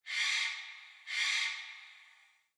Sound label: ambient tropics birds